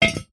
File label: metal heavy solid item set down on hard ground gently; zapsplat